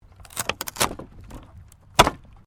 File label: WOOD PLANK SPLIT